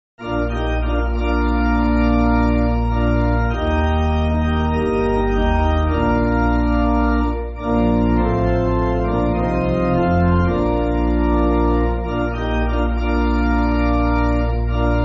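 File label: Pipe Organ, Backing Files for Churches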